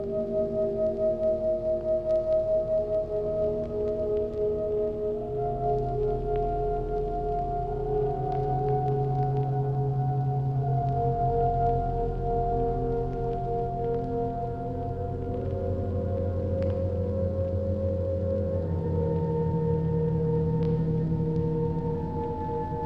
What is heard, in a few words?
Ambience; Sacred Citadel; PS3; Windows; Xbox 360